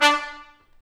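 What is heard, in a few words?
BRS TP STA, brass trumpet stabs; Roland L-CD702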